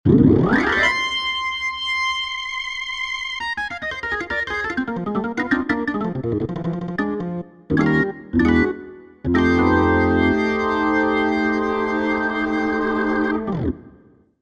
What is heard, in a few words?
Organ